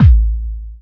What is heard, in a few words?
DR. LOOP, DRUMS; X-Static Goldmine 3